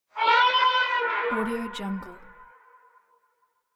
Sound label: elephant trumpet